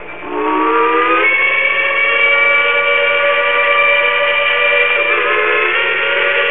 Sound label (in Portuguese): Apito Longo; locos